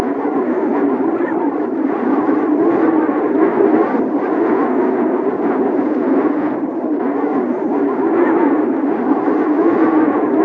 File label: Wind whistling; Wei-Hsiang Liao